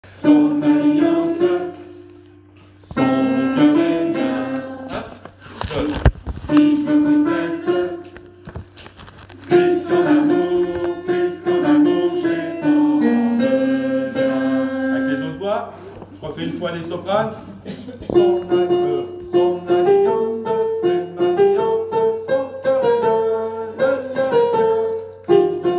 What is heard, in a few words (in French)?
Tant que vivrai basses soprane